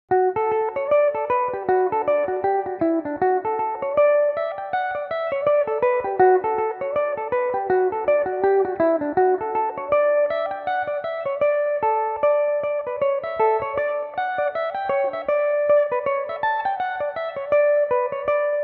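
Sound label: D major belmuse, irish mandolin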